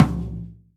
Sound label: wet tom drum single hit a sharp key, Royality free tom one shot tuned to the A# note